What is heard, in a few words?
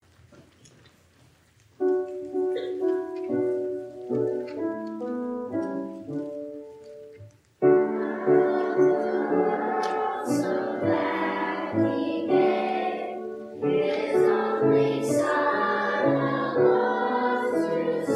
children, sunday school children; God Loved the World So That He Gave; Sunday School Performances